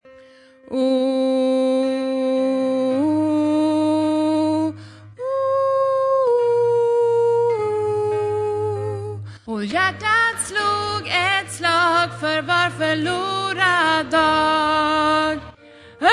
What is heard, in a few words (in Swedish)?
öppna din dörr bas